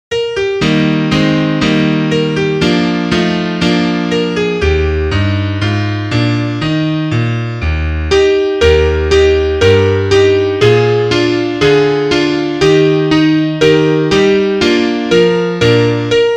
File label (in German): Klavier; vogelhochzeit